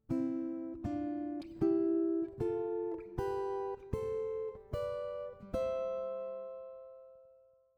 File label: C Major scale in 3rds, guitar; how to harmonize a melody on guitar using 3rds and 6ths